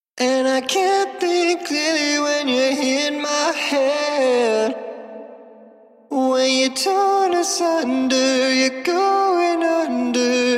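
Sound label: electronic 118 A minor, acapella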